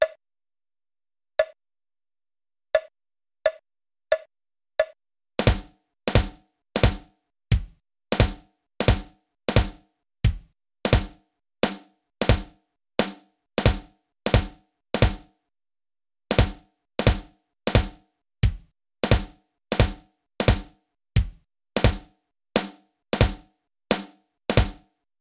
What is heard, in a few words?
Warm-Up, Drum Recordings